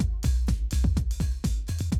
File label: 125, Classic Drum Loops